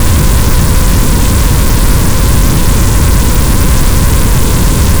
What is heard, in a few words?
space Engine Large; Kenney's Sound Pack; Sci-Fi Sounds